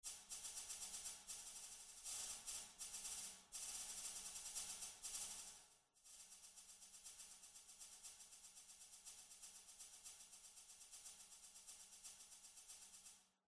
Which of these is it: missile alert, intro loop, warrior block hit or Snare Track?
Snare Track